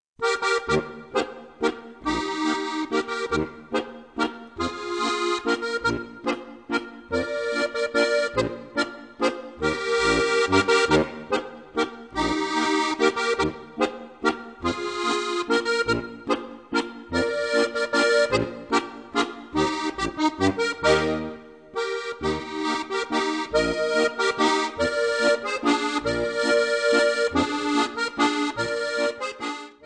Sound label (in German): walzer; Spielstücke für Steirische Harmonika, Band 1